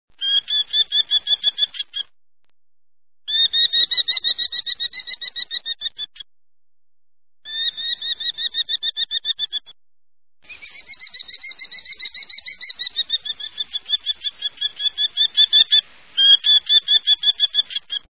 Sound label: Merlin, songs